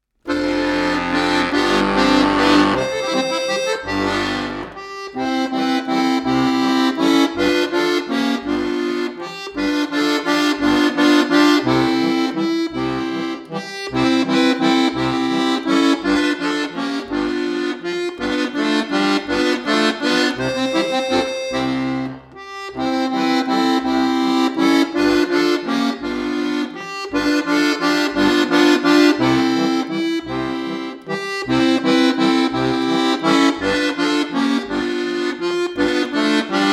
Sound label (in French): bouillon de legumes instrumentale